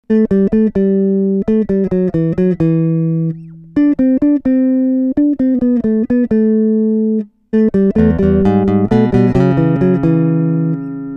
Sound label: dd20 sos